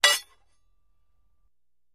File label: Axe Clinks, Dull; Light Fast Metal Clank Of Axes Scraping Together. - Metal Scrape